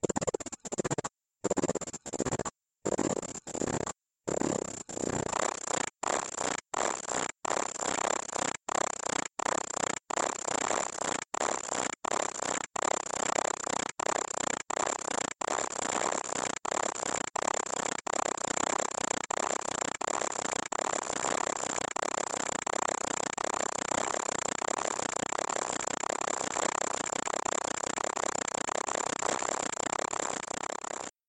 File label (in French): tube Pvc